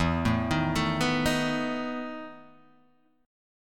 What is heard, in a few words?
E Major 7th Suspended 4th, Guitar, Open D